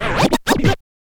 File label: scratch kit, vinyl; Masterbits; Vinyl Attack; scratches